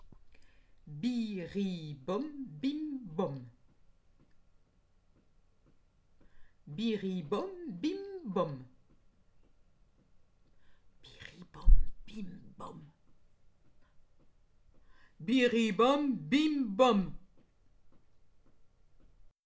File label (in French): jeu avec les syllabes de la chanson